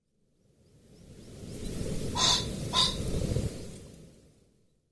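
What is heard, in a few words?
Common Pheasant; koe; ishigaki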